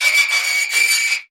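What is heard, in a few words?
sounds of scratches on metal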